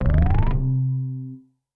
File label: Reel Gamble win; CashBomb